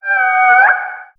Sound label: scream